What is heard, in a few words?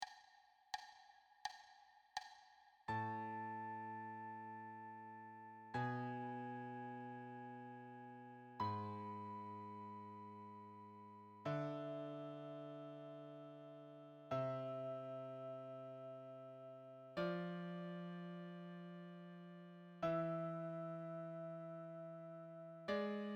wholenotes duet